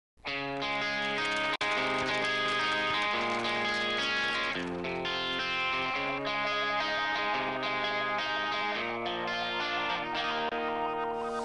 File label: Intro Karaoke Version; Phineas and Ferb